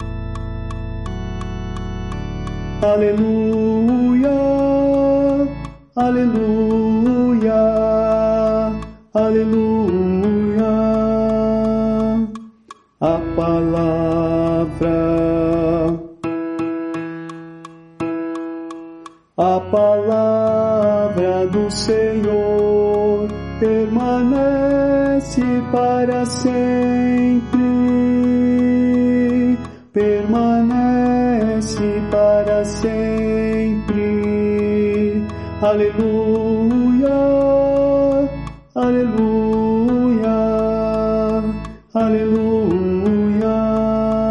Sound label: tenor